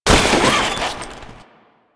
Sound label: Military Backpack Destroy; Objects; Warframe; Storage Container